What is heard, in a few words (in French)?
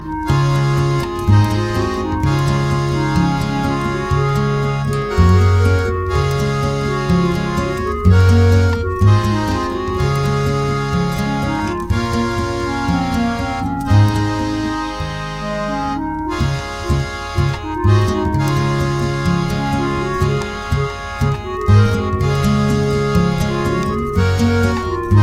extrait instrumental